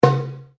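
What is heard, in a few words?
Drum Darabuka Slap mezzo forte, Egyptian Darabuka Drum; Commanly available Egyptian Darabuka Drum; Musical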